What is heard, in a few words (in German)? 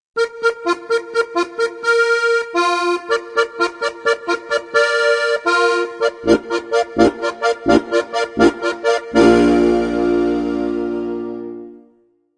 halali signal